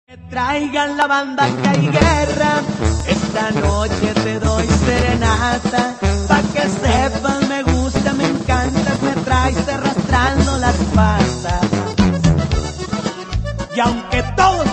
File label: ringtone